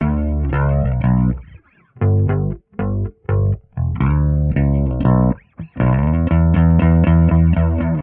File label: bass, Loops, Phonky